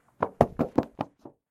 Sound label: run